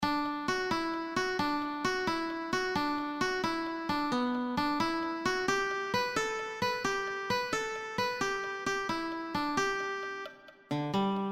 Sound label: guitar